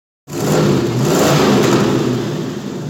V8

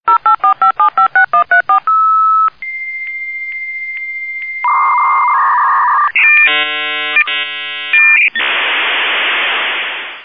Modem Dial Tone

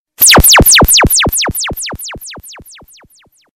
Blackberry Laser

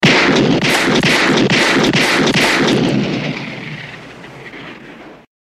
Gun Sound Cool-armed

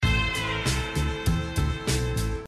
Text Tone

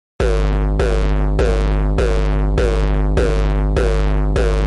Electric Msg

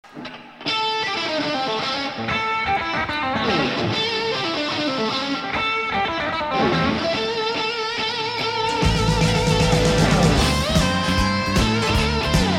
tired ringtone

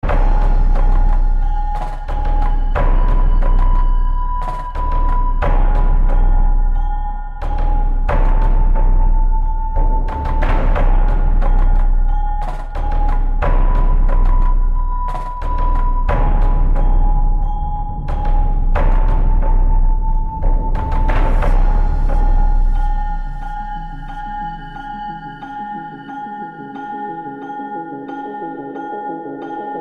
Thunder; Rust

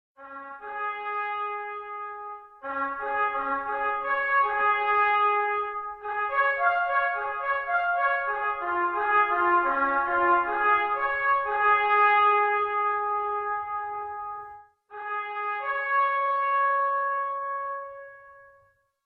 Trumpet

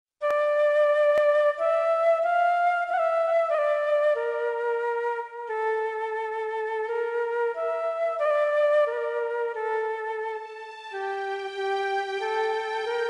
Japan Flute

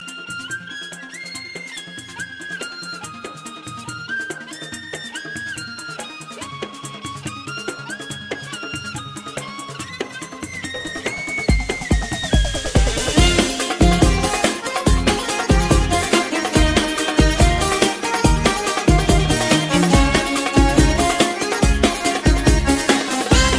Oriental Instumental

